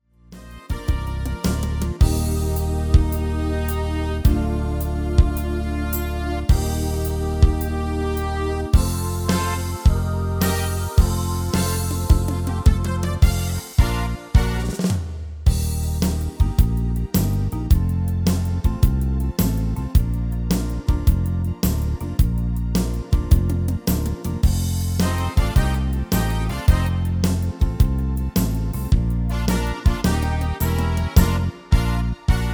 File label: MIDI